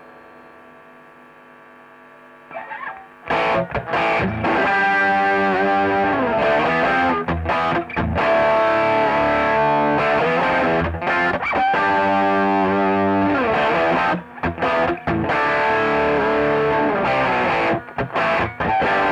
E Gtr

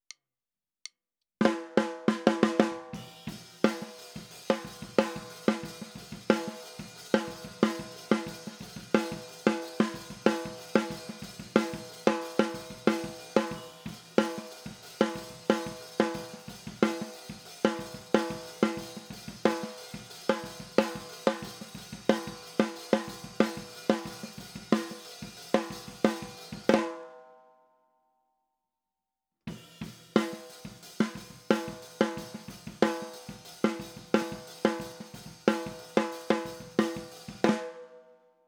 SNR

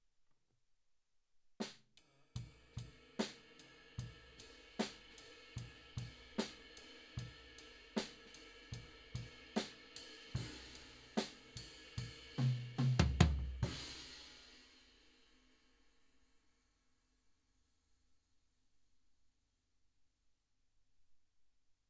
floor

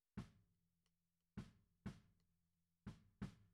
Snare Top

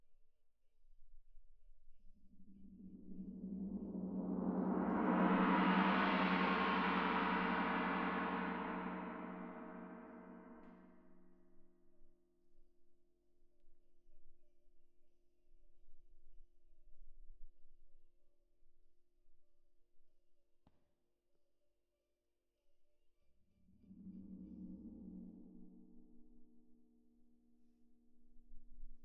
Tam-Tam

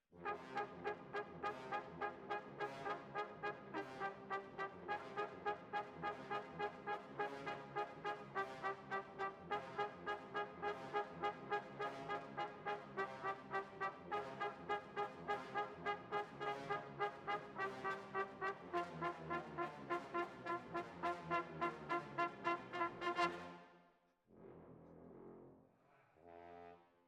Trumpet

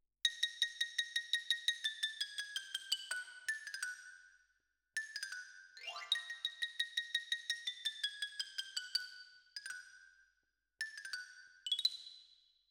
Xylo

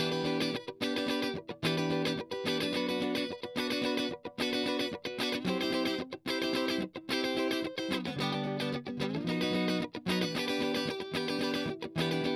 Strum

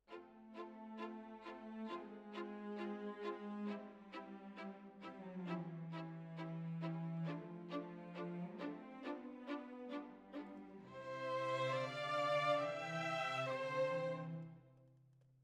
Violins, jazz for piano

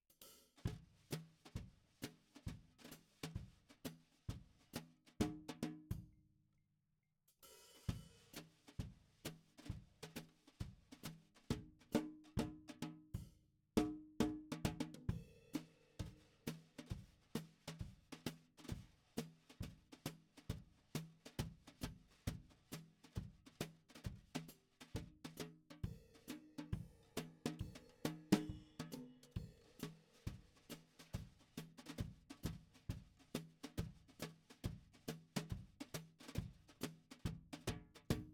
SNR top

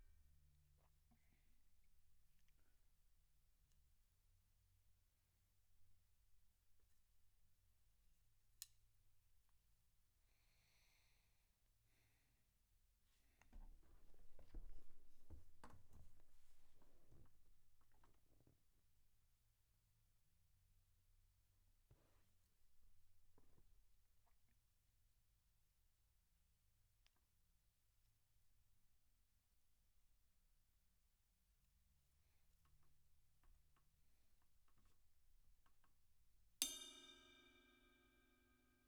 SNR Top

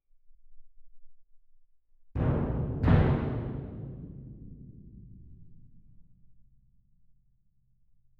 Perc

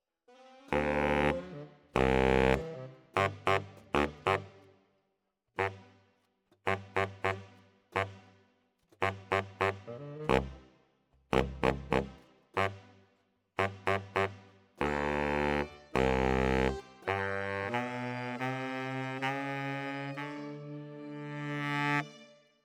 Bari, RNR for piano

